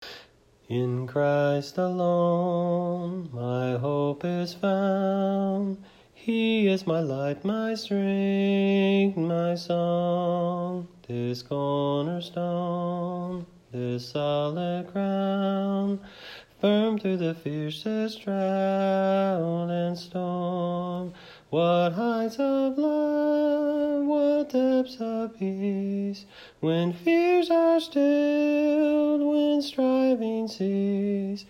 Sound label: SOPRANO